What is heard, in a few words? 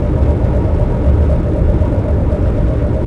cube, ambience; doomgiver